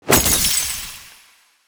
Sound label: Damage; SNKRX